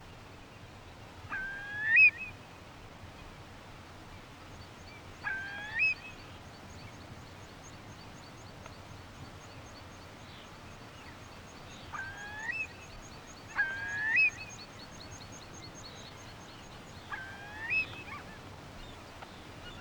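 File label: Scottish cottage exterior morning; the 39 steps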